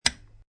Lamp Twist On Off, Single sound of a lamp being twisted on or off; Lamps; Household